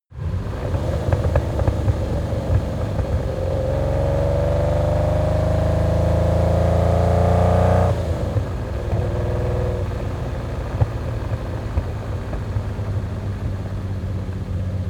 car lamborghini countach small accelerate decelerate clutter